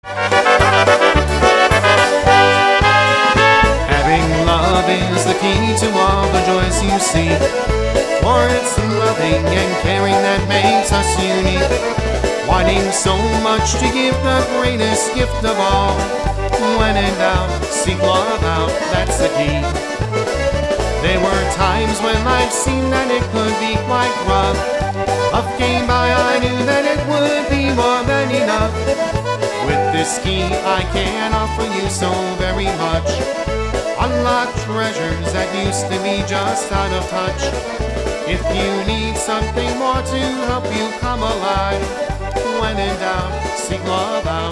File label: Polka